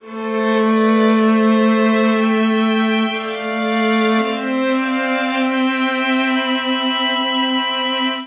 Phrase Reflecting i-III A Minor 116 bpm, String Quartet; MIDI Clips; Ableton; Factory Packs; Spitfire Audio